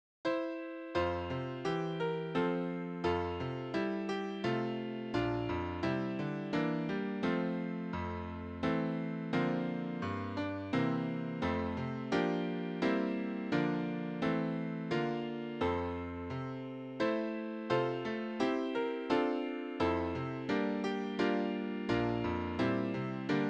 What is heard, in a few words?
Away in a Manger, easy piano; Hymn Arrangements for the LDS Pianist